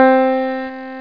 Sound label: PIANO; Sound Library 2000; Wayzata Technology; Instruments